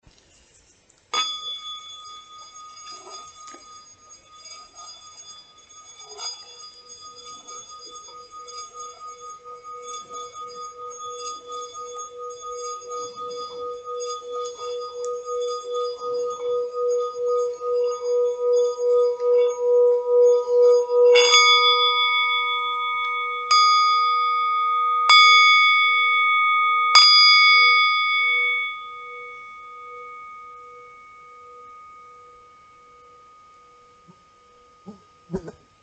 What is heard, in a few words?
singing bowl green donut